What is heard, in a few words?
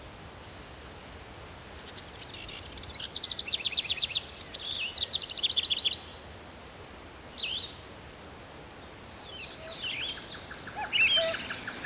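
Ambience Human Day; Ladianes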